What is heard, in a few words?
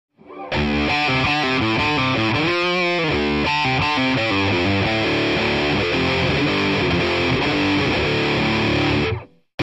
Beyerdynamic M260 - Gain (Bridge)